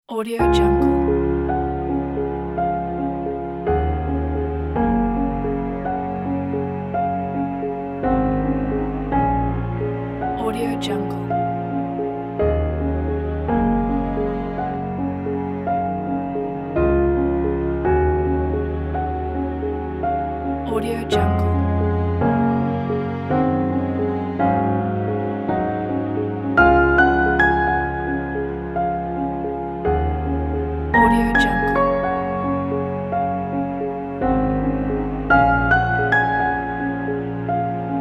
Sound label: uplifting piano